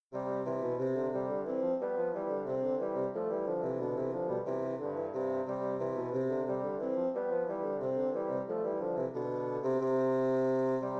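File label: rigaudon bassoon; chedeville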